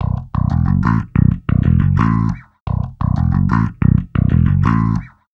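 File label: Pop Smallstone Bass, D major; Pop Rock; Pro Tools Essential Loops; Digidesign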